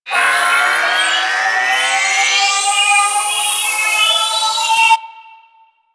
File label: Wailing Charge; Enemies; Warframe; Vomvalyst; Teralyst; DE